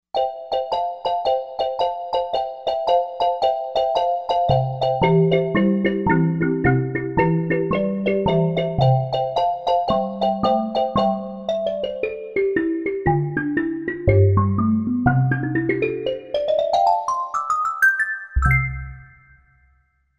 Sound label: Wood Sequence